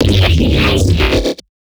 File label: Growl Bass FX; neuro; BIG5AUDIO